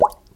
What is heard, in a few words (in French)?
Bulle